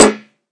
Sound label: Fracture Snare, Found Percussion Hits; UNUSUAL KITS and FX; ModeAudio